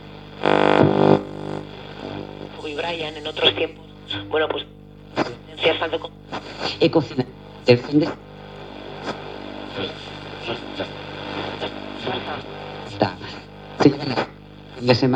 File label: Tuning